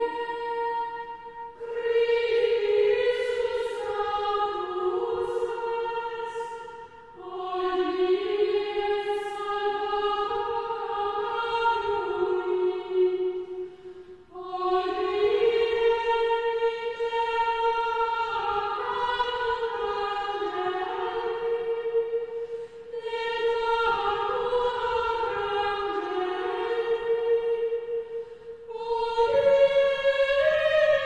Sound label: Chant Antiphon; Traditional; Chester Processional